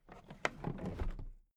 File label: toolbox put down regular